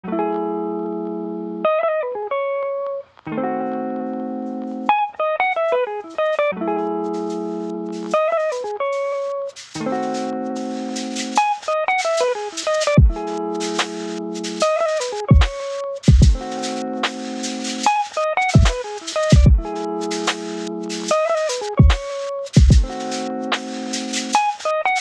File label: sample hold; LANDR; full Low Balanced